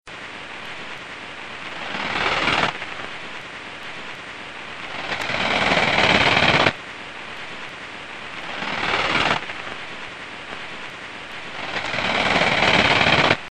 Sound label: chorthippus biguttulus, heuschrecken; insekt; wirbellos; biologie